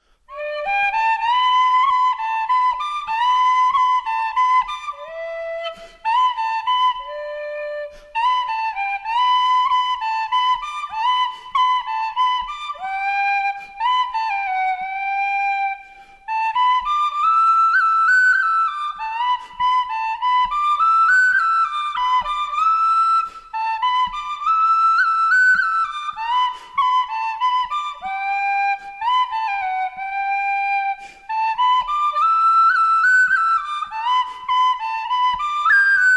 whoistle

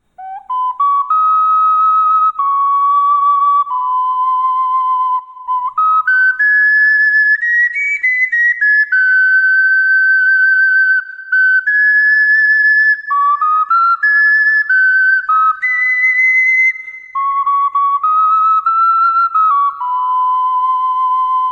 ocarina; １３穴オカリナ; ハ長調

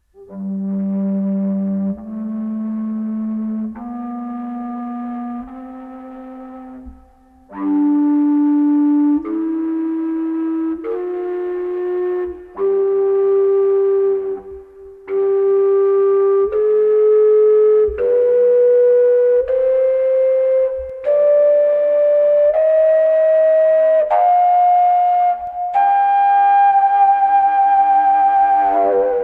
fujara do re mi; フヤラの２オクターブの音階

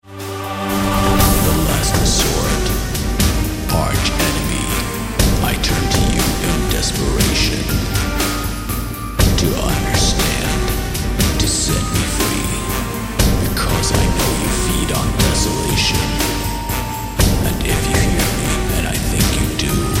bgm

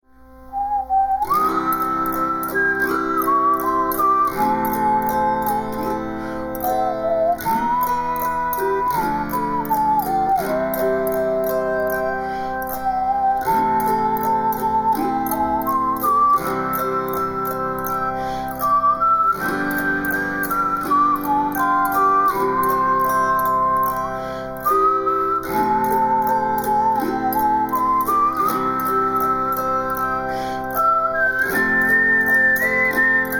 autoharp

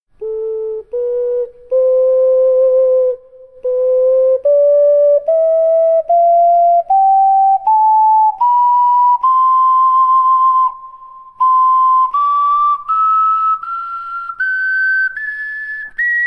scale; dbloc

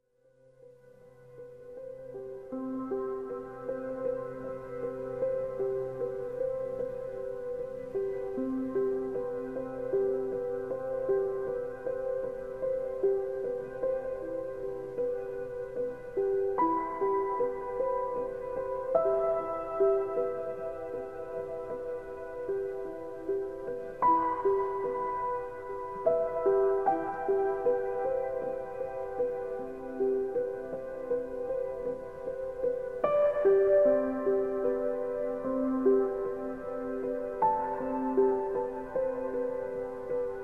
bgm

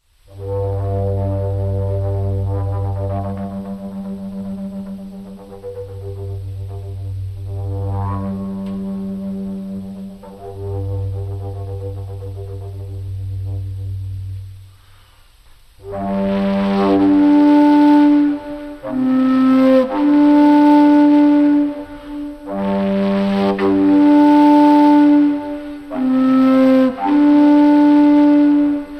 fujara; フヤラ; 世界最大のオーバートーンフルート; スロバキアの羊使いたちが演奏する

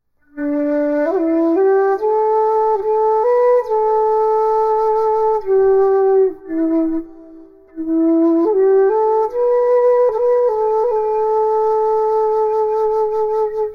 irish flute

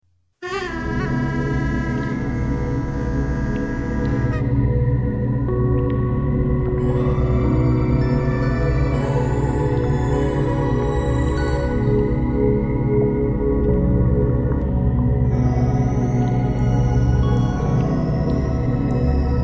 bgm

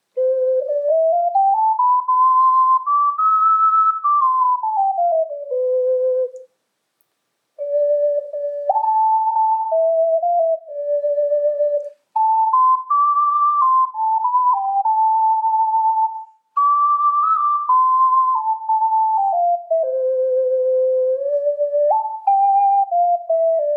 SeedPod

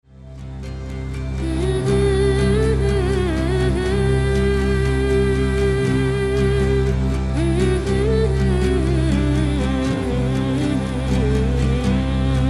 bgm

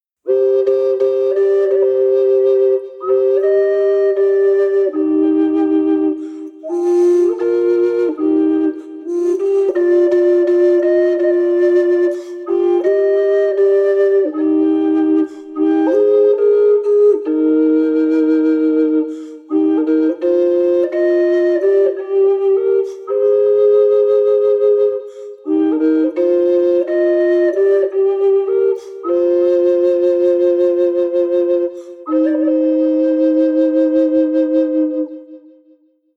aztec double

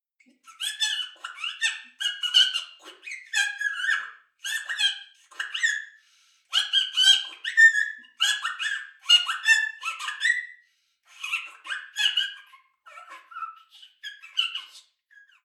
bird whistle inko; バードホイッスルの試奏-インコ; オランダからやって来た鳥寄せ笛です、小さいのに高性能。; いろんな鳥の声を真似ることができます。

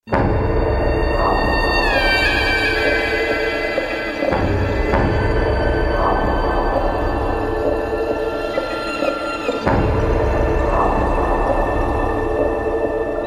bgm

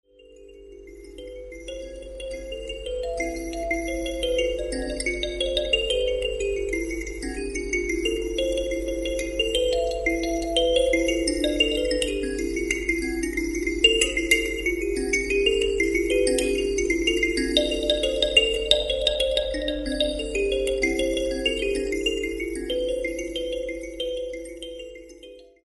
kalimba; ハンドオルゴール; 両手でもって親指でキーをはじくとオルゴールの音がします

